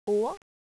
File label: a ring